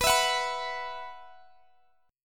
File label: B Suspended 4th, Guitar, Open G